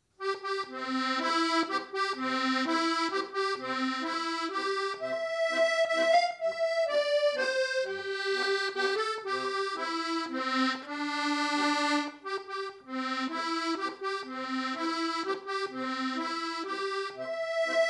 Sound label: waltz; cumberland waltz